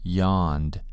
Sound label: yawned